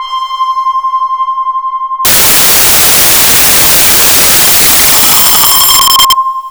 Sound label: signal